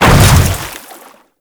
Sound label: Hammer Boss Land Water, footsteps; Warframe; Grineer Hammer Boss